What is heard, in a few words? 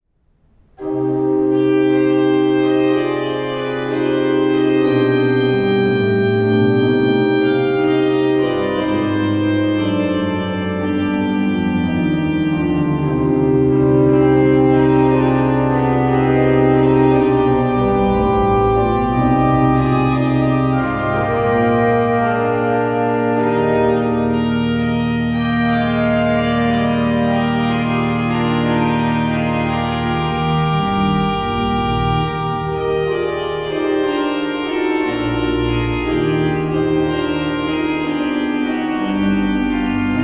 Larghetto, orgue; valais; Brig; Alexandre Boëly